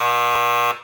buzzer wrong; 2fast2curious; boss spellingbee cardgame

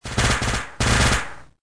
battle Noise; patcheconwarsdx

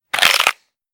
candy crunch

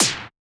hit heavy; battle; cross-code

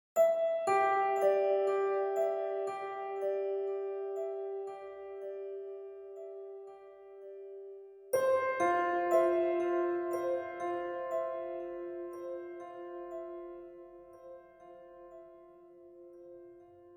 music fly

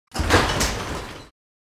lift on; coolmathgames

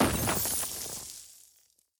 vein found

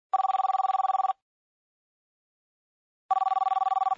phone ring

